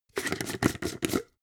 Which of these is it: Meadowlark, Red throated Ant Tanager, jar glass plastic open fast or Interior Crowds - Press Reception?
jar glass plastic open fast